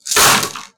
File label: methcrate fill